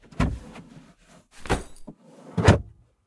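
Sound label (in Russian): недолгий отчётливый звук закрывания ящика стола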